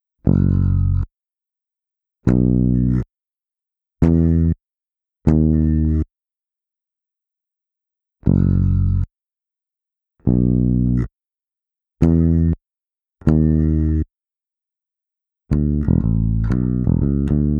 fall bass only

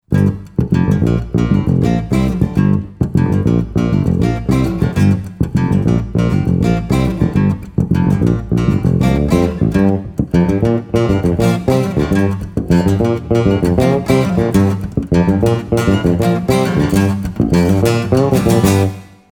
VIDEO OUTRO

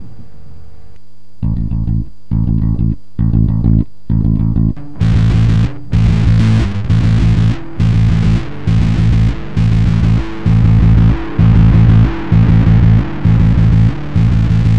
tonebender knobs variation

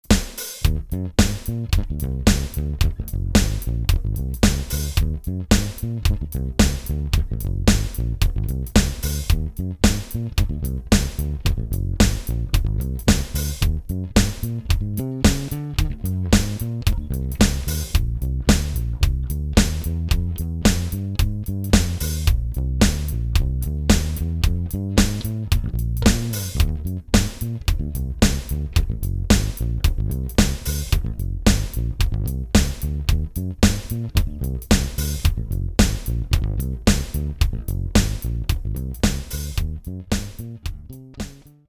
Focusrite Tone Factory

